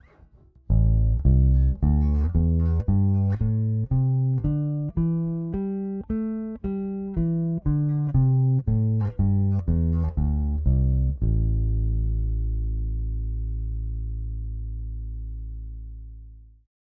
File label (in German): Aguilar Super Double Neck PU aktiv